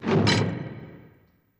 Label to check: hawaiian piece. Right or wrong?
Wrong